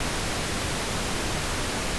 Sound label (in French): Bruit rose